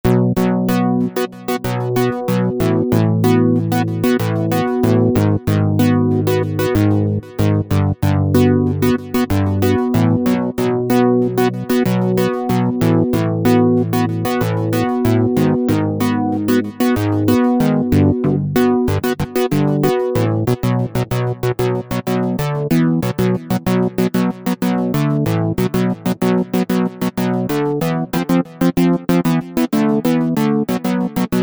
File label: SYNTH BASICS - Sequence Element; Apple GarageBand 2